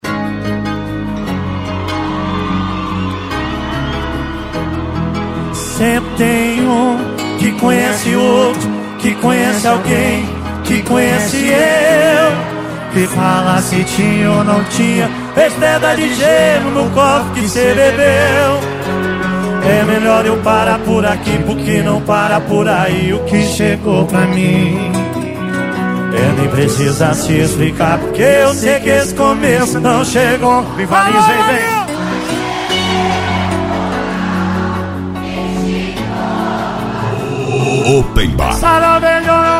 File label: Sertanejo